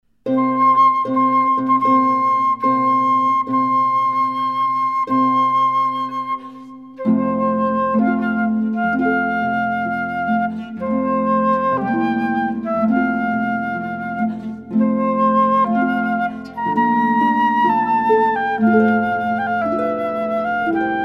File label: harp